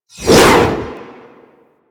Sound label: Arch Wing Sword Whoosh; Warframe; Weapons